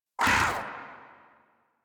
Catbrow Combat Vocal Attack Short; Warframe

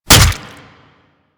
Barrage Hit Flesh; Warframe; Warframes

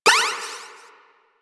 Snake Game Turn; Warframe; Nineteeen Ninety Nine

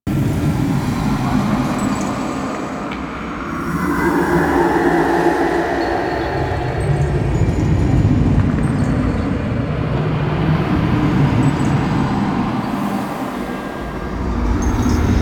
Moon Interior Ambience; Warframe; Orokin Moon

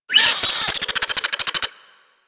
Infested Minion Pain; Warframe

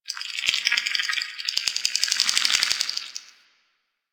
Cave Creature, Ambience; One Offs; Creatures; Warframe; Quests; The New War; Drifter Camp

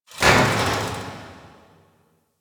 Corner Hall Rand Metal; Warframe; Ambience

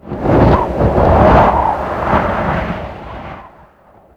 Mountain Pass In Ear Wind Gust; Ambience; Warframe; The War Within

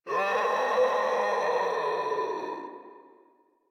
Infested Runner Death; Warframe; Runners

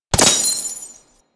Glass Shotgun Projectile Hit; Warframe; Tenno; Weapons

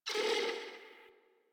Landscape Mid Creatures, Ambience; Warframe; Venus Open World; One Offs

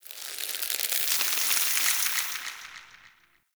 Under City Vine Creak

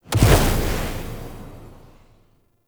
Energy Spike Cast; Powers

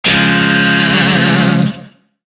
Shawzin Phrygian Chord B; Music; DE; Warframe; Lizzie Shawzin

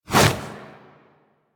Edge Fist Swipe; Warframe; Warframes; Pacifist

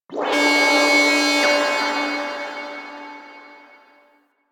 Capital Ship Alarm A; Mission Two; Warframe; Quests; TheNewWar; Ambience

